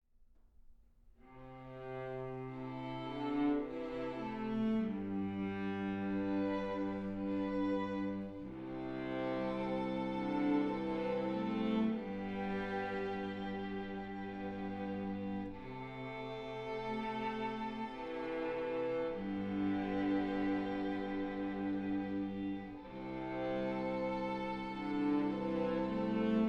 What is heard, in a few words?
without Ukulele